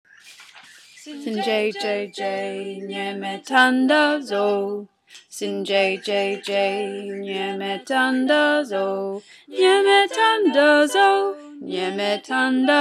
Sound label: bass